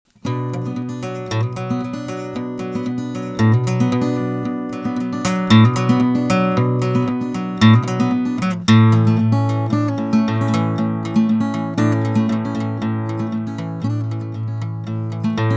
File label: ae5100 flats fingerpicking